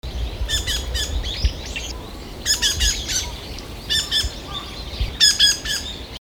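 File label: tugumi, dusky thrush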